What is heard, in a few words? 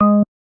bass, programmable speaker